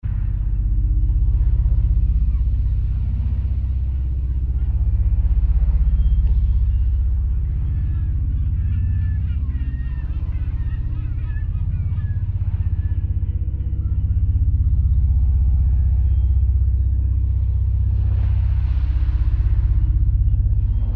Ocean Sailing 16Hz

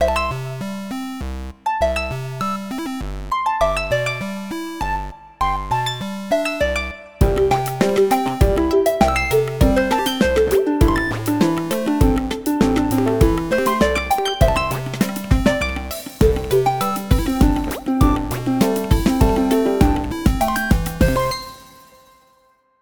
Retro Bouncy

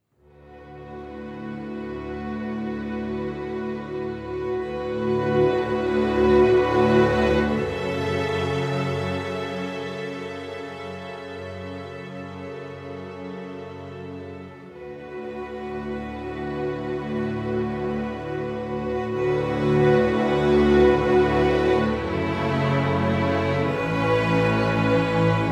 More Basses

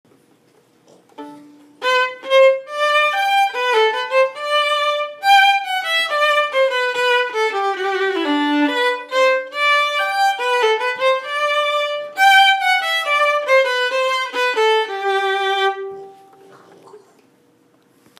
Gavotte

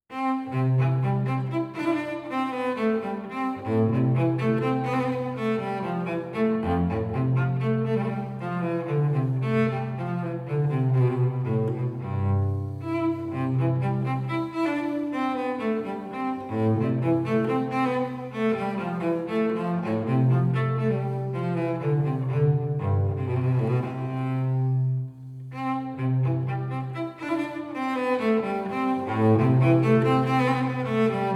Minuetto

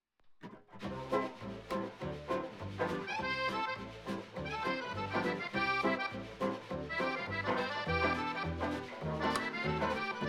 Swing

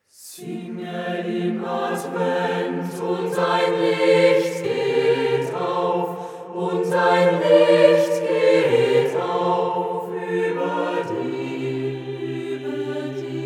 SATB

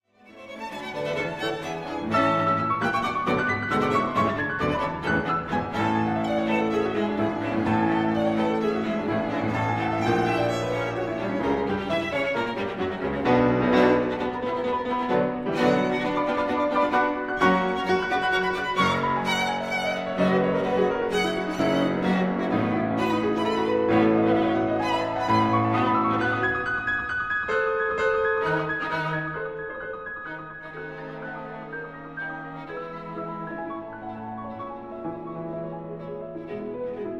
Piano Quintet; Op. 81, IV; Pressler

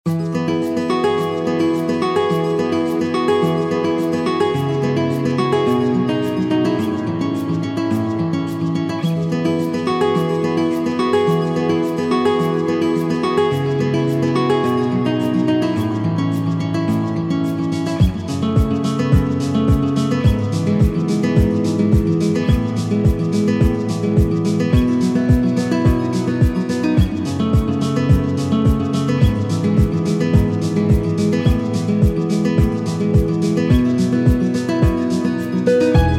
Bright, Happy Feelings

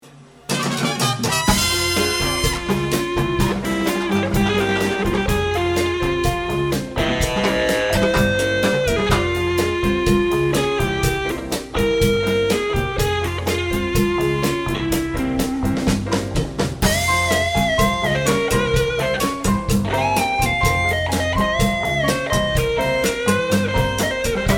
smooth guitar

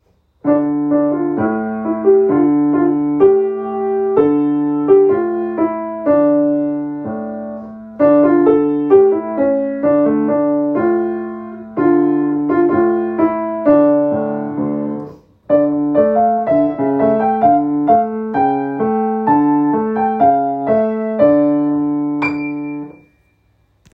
Latvian folk song

